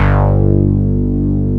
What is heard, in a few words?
OB FILTER G1; E-MU Formula 4000 Series Vol. 3; Analog Odyssey